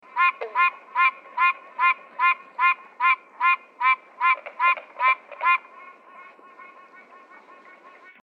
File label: Tree Frog Ringtone; android